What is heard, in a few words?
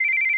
8bit se